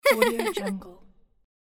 girl laughing

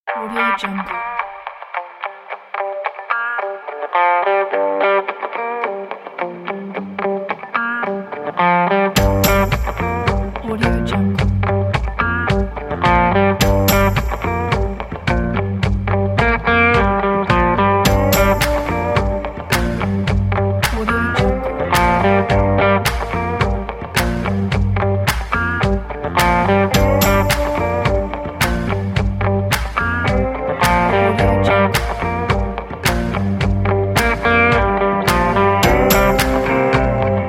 funky blues rock

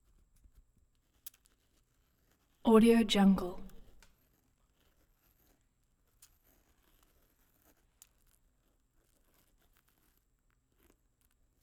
int bone and flesh; collanderson

